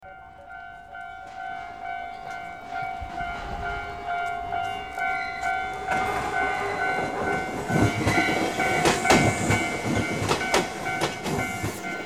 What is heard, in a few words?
toy telephone story and atmosphere